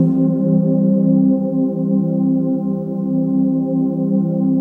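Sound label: trance pad; Best Service; XX Large - Pads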